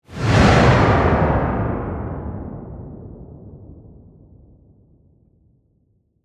Shockwave Blast, Scifi, Explosion, In, Space; Spacecrafts

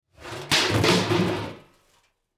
Garbage Can Lid, Garbage Can Lid Fall Knock Over; Household

